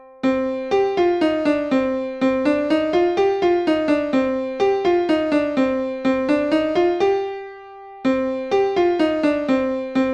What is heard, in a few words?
Piano Top Tone